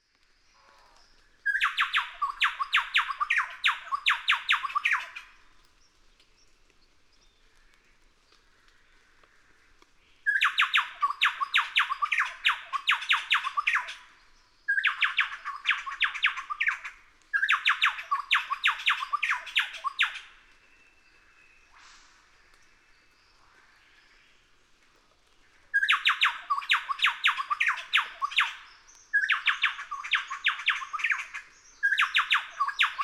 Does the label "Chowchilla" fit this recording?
Yes